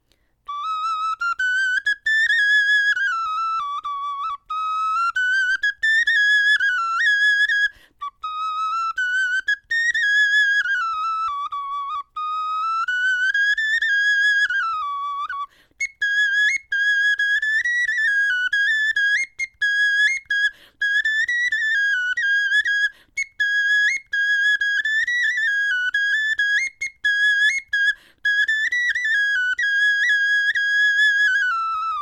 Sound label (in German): 15C melodie, knochenfloete